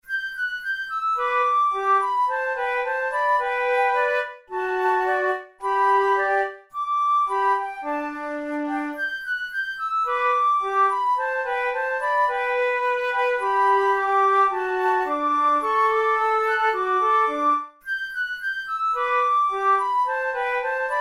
methode de flute; tulou

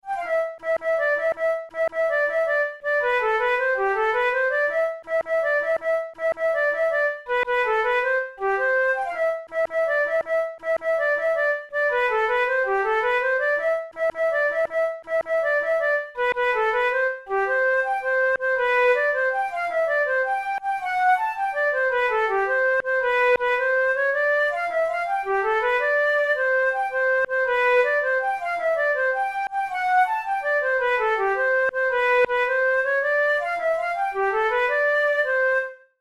dance, flute

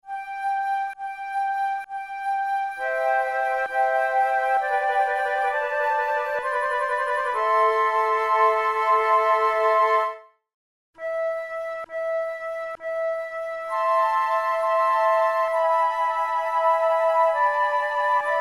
flute sonata adagio; mattheson

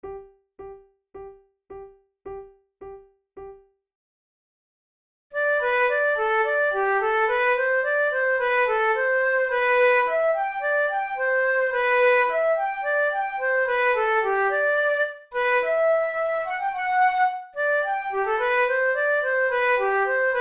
allegro, flute tunes; loeillet de gant op5 no3 v

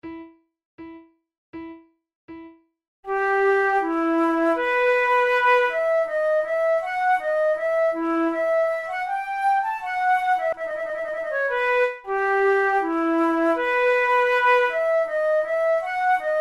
flute

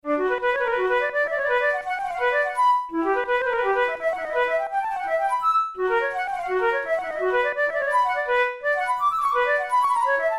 studies for the boehm flute; drouet 72

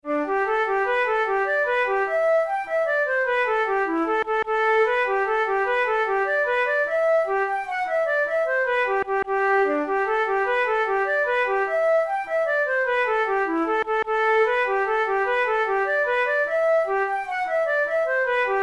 jig, flute

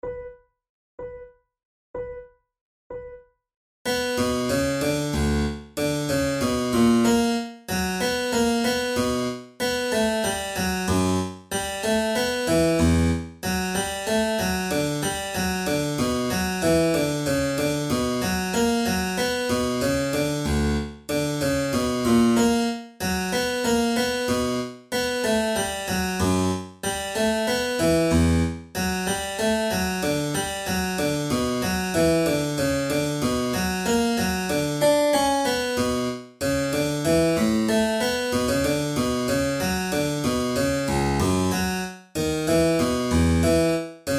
flute sonata in b minor a tempo di minuet

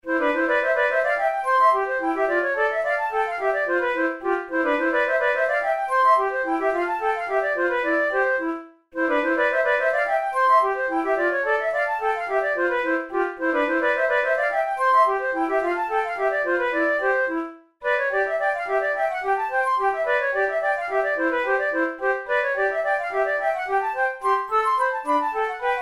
quadrille duet, flute; la cordelle

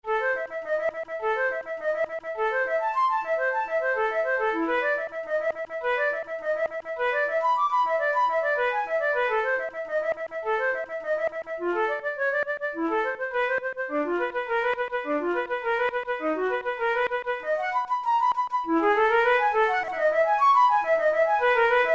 study in a minor, flute tunes

